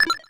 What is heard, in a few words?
button